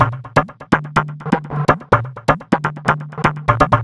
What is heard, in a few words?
Biz Tabla; перкуссия